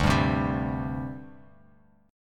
D 7th Suspended 4th, chord; D7sus4 strummed

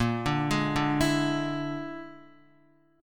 Bb 7th Flat 5th Bb, Guitar; Bb7b5; arpeggiated; JGuitar

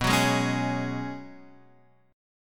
Db 7th Suspended 4th B, guitar

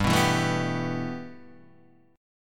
G 6th Add 9th, Guitar, Open D

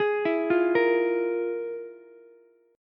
F Minor Major 7th Double Flat 5th, Ukulele; FmM7bb5 arpeggiated; JGuitar

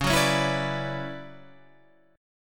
D Major 13th C sharp, chord

